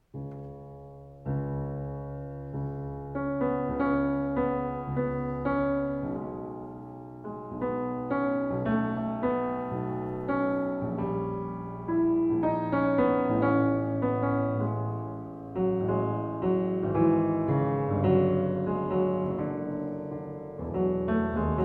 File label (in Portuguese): Sonata Para Oboé e Piano, Lento, Mas Não Muito